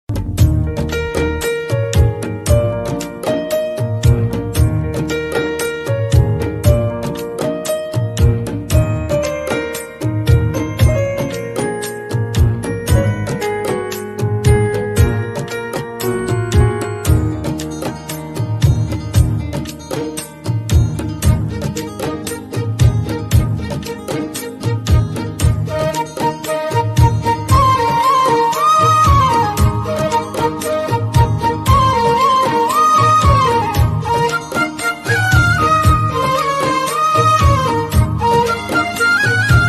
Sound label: tamil movie bgm ringtone